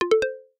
tadah; gmod; dconfig 2 workshop content

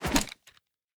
punch gun miss; gmod; arccw uc commons attachments

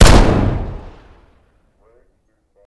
fire dist int pistol heavy

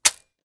mech, 40mm; gmod; arccw uc commons attachments

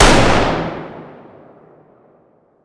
fire dist int rifle

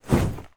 sledge draw; melee weapons; tfa starwars melee weapons pack

